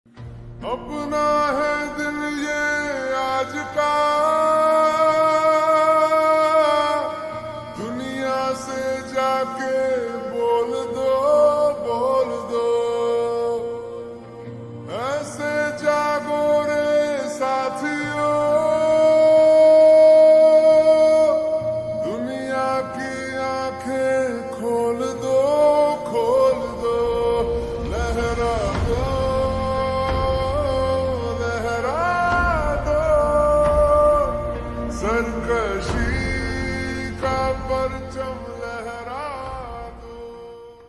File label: Ringtone slowed reverb